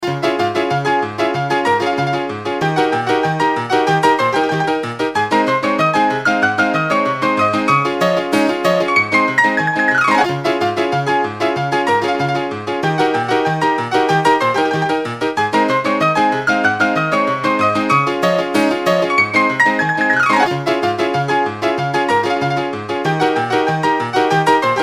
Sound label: Slapstick music comedy